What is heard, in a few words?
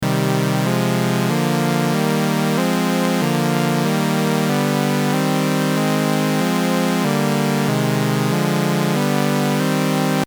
all osc